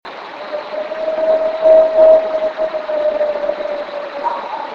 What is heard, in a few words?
Howl; The Kentucky Vocalizations